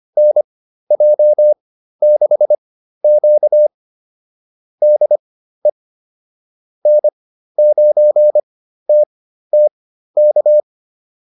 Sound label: QSO, intermediate practice files